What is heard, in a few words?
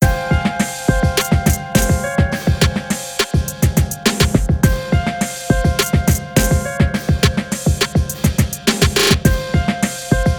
Hard Rock Attitude Rap Freestyle Beat